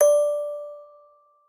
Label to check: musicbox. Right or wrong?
Right